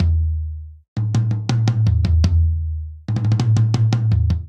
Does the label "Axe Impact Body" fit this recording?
No